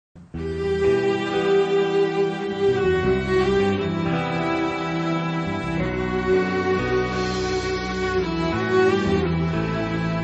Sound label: Ringtone Mobile